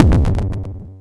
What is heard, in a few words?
Kick Wiggle, Cwejman S1